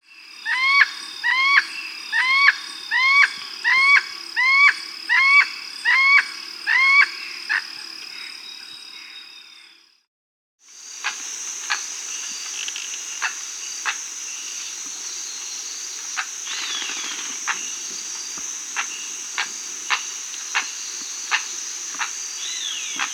Ivory-billed Aracari (Pteroglossus azara), Peru, Bolivia; S. America